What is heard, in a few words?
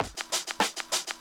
Shaker Loop